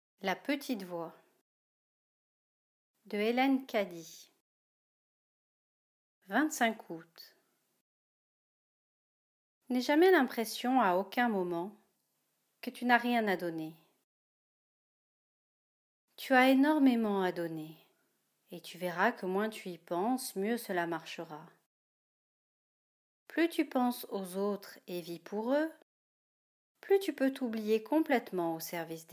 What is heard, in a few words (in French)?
Petite voix